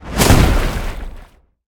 explode; smite; god sol

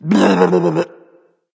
nv tongue; smite; hachiman

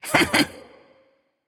hehheh; smite; jingwei

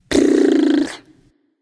tongue; Smite; artio

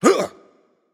surprised; smite; raijin

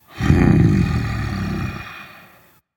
thinking, dragon; fafnir

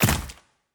movement run; smite; vamana

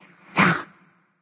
grunt jump; smite; ahmuzencab

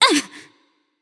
surprised, voicepack; smite; pele

